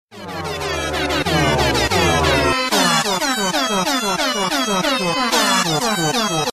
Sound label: airhorn loop